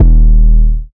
808 D#; Cymatics; Oracle Sample Pack; 808s

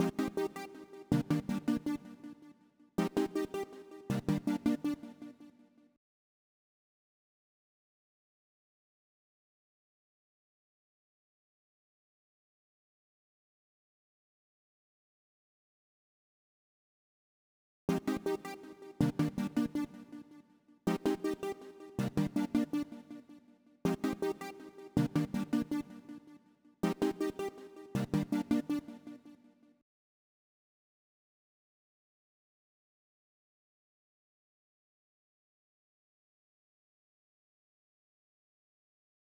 161 BPM C Min Synth, melody loop stem; Loop Stems; Cymatics; 2022 Melody Collection - Wavy; Location

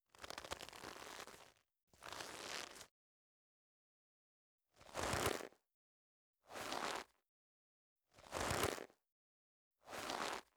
Rope Creaks Leather; Fairlight Sound Lib

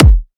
Riddim Kick

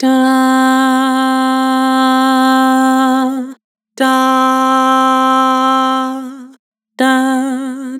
Vibrato "Dah", Vocals; Harmor Vocals

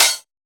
Open Hi Hat, Dubstep Cymbals; One-Shots; Drums; Ghosthack; Ghosthack - AC2024 - Day 13 - Dubstep Sounds 2025

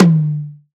Live Tom - E; Cymatics; PHARAOH Beta Pack; Pharaoh Premium Drum Samples (BETA); Toms

